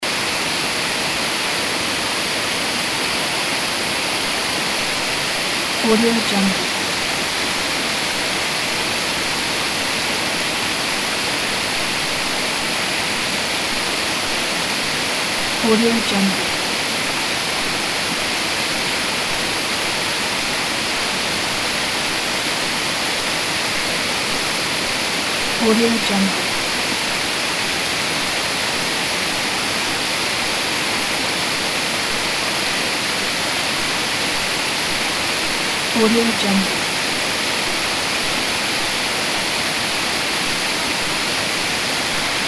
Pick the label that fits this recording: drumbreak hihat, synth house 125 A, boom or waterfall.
waterfall